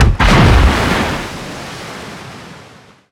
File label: damage hits explosions; World Of Warships